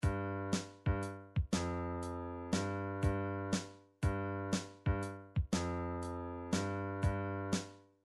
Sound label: drums motown